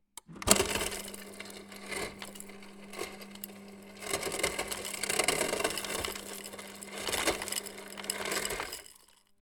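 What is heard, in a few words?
Ice Dispenser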